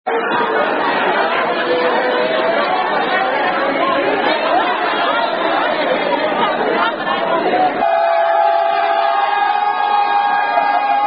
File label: Chants Battling Between Fraternities; Alpha's Vs Sigma's; 6 Chants Battling Between Fraternities (Big Daddy Longstroke, Zoom Zoom Etc)